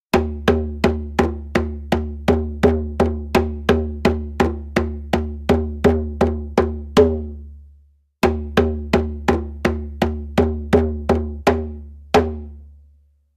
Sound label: Kundu; in PNG verwendetes Schlaginstrument; Weltgebetstag 2009; Singkreis